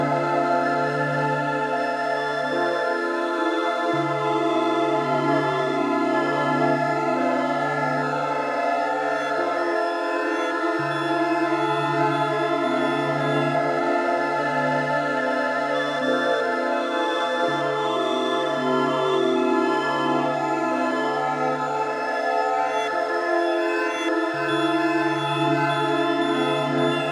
drone

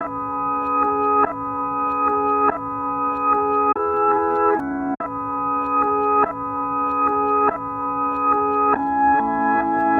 fx loop glistening crystal 144 E maj

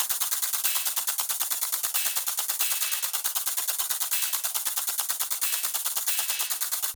Hat, 138

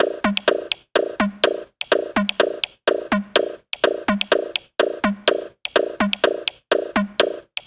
PERC 125

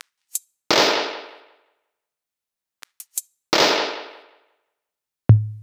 170 Perc

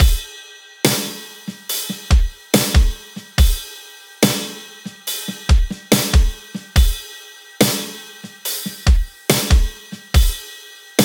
Fat Step Beat, 142

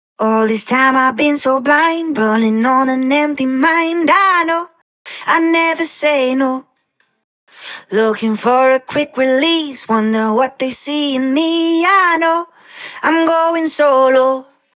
Break Main Vocal Verse dry 124 A minor

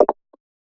PERC plop

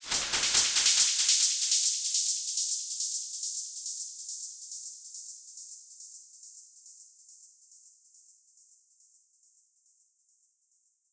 140 C Crashed Fx

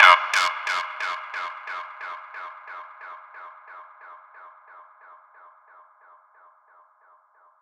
FX Vox Dub

